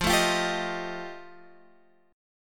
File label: F 9th F Guitar Open F, chord; strummed